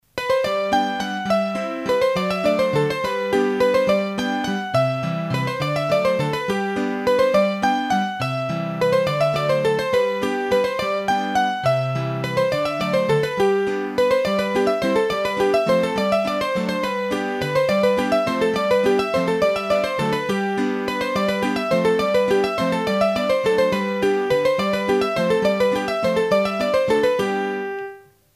Bourree, hurdy gurdy; Massif Central; Bourrees a 3 Temp

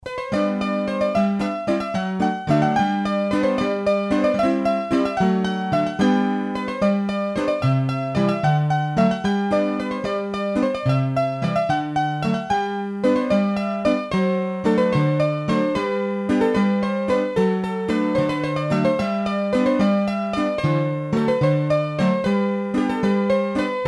Bourree du Pere Duret, hurdy gurdy; trad; Bourrees a 3 Temp

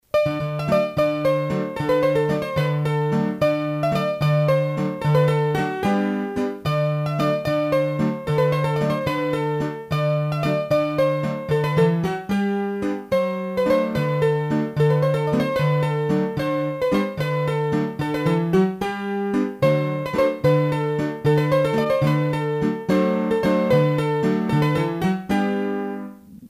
Bourree d'Aumont, hurdy gurdy; Bourrees a 3 Temp

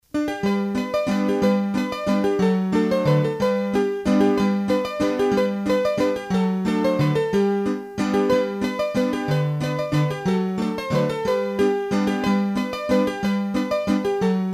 Mazurka, hurdy-gurdy; Mazurka de Lapleau; Mazurkas

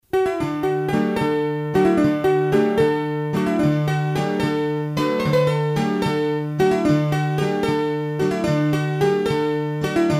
Montagnarde, hurdy gurdy, Bourrees a 3 Temp

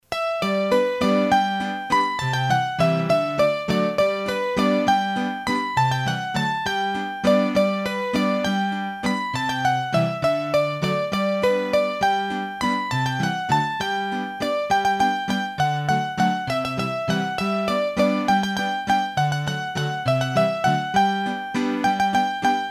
La Bourree Droite du Pays Fort, hurdy gurdy; Massif Central; Bourrees a 3 Temp

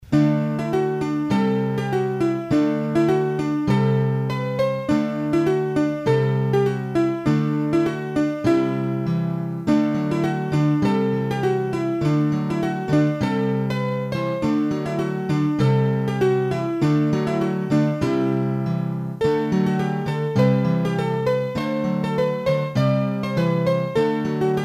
Jupes Fendues, hurdy gurdy, Bourrees a 2 Temp

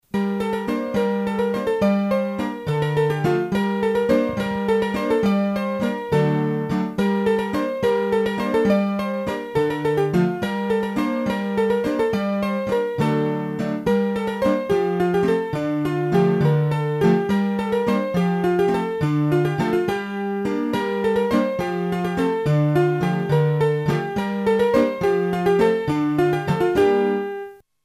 Tiennet, hurdy gurdy, Bourrees a 3 Temp

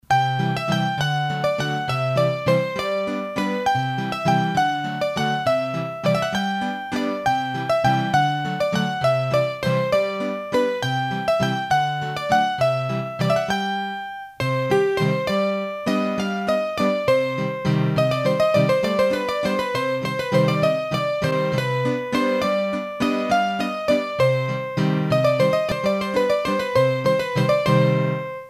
Bourree, hurdy gurdy; Massif Central; Bourrees a 3 Temp

